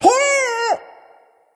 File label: surprised; smite; ahpuch